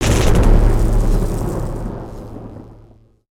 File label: Lightning Rail Start